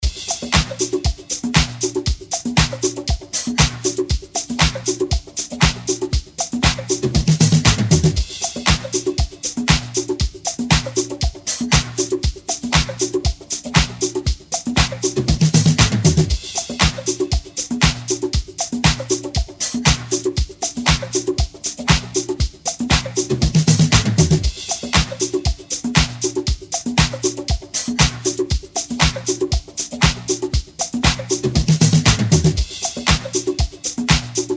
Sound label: DISCO bpm 118